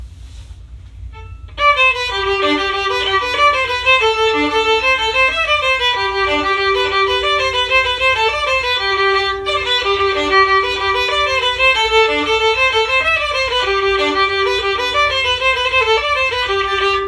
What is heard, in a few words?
Irish Washerwoman Melody